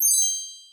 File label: sparkle; Dora and Friends - Dora's Wonderful Wardrobe!